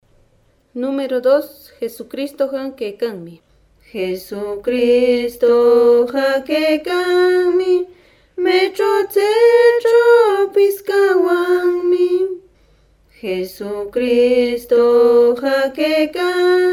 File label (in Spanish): cancion